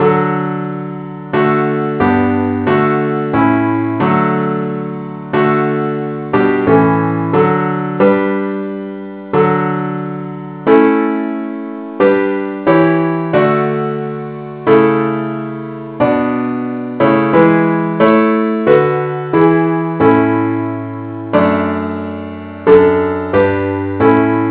parts only key D; christe Sanctorum

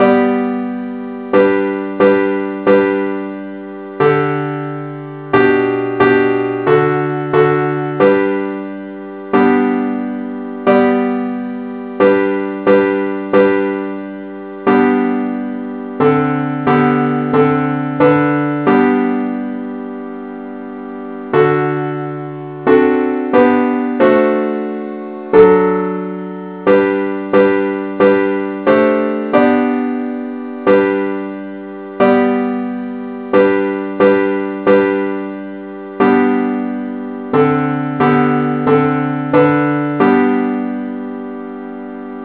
parts only key g; henley

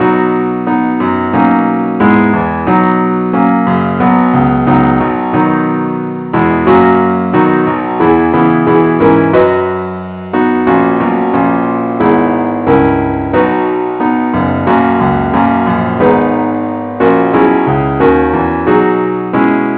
keyboard only, key b; christe Sanctorum

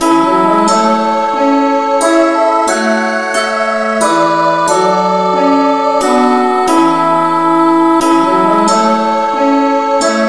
no vocal key A

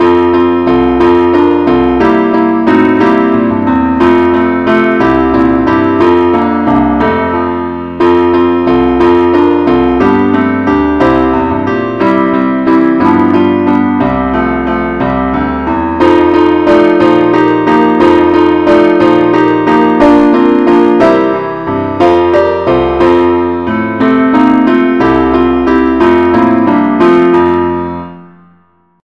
keyboard only

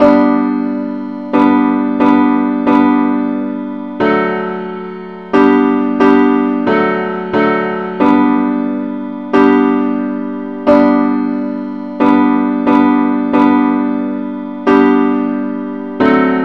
simple acc key g

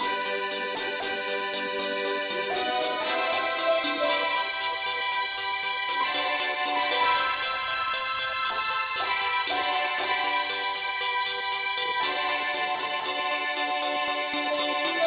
mandolin lead key G

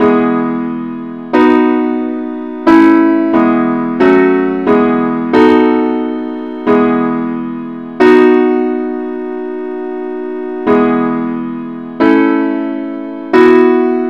simple Acc key e

